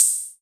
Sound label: CR78 hat; E-MU Formula 4000 Series Vol. 2; OrbitPercMaster